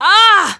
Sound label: uggh; alyx; svencoop addon; playersounds